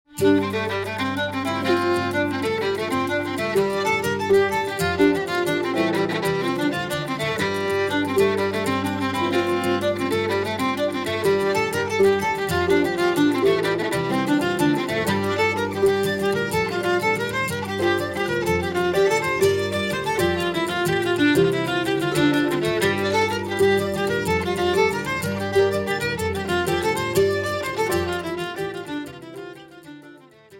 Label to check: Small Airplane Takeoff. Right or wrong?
Wrong